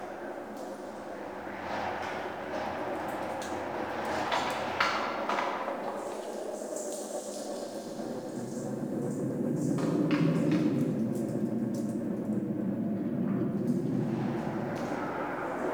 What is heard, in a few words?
Cave Narrow Ambience Loop